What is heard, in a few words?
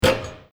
Basketball Rim; iMovie '08 Sound Effects